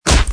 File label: blocker hit